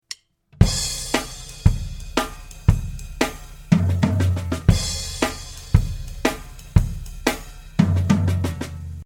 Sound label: Two Beat Triplet Fill